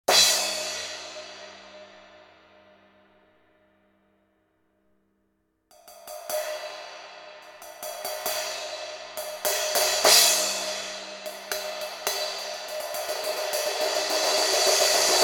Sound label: kurak crash 15